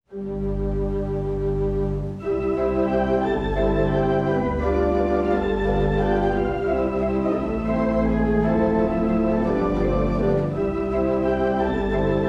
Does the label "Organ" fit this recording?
Yes